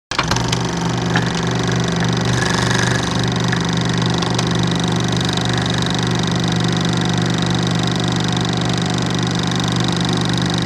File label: starting projector